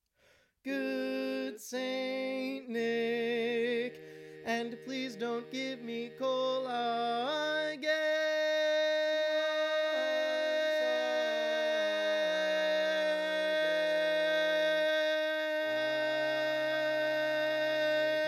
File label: Bari, barbershop; Each recording below is part predominant - one part louder, other parts quieter.; Arranger / D.B. Martin / Year: 2024